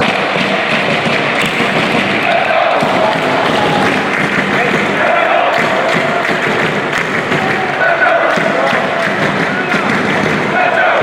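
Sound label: claps, football fan chants; red bull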